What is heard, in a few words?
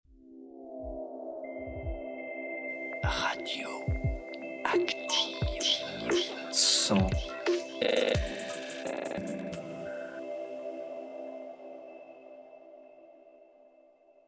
Active Jingle; Radio Active